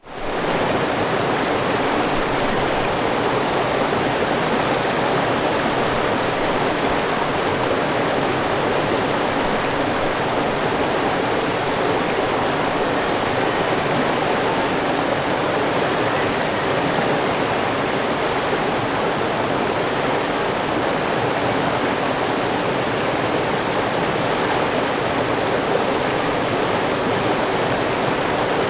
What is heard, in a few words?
river; nature